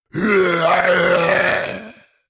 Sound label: zombie; zumbi game